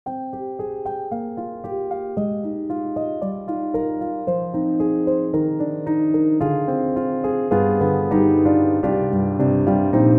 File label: Pure Piano; Steinberg HALion 7; Verve; Pure Flet Piano